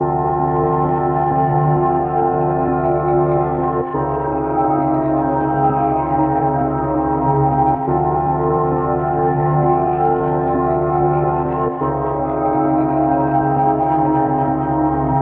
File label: detroit techno loop